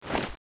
zoom out, weapons

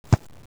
kick

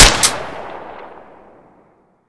sound survivor m4a1; cstrike; ZP CRISTIAN

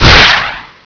missile; zombie plague

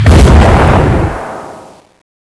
tornado flame

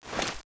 draw, weapons

